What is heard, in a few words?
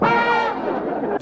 trombone